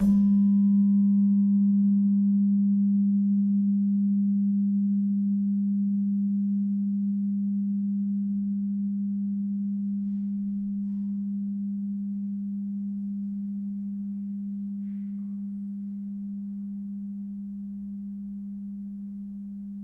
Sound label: Slentem, gamelan